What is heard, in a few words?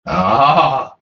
awwwuhhh